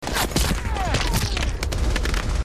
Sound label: bullet hit